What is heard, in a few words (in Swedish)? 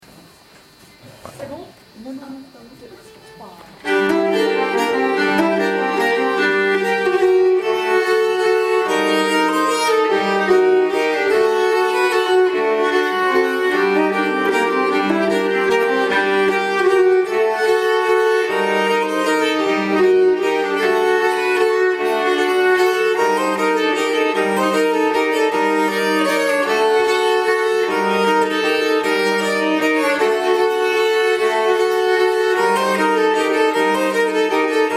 stulenvals, nyckelharpa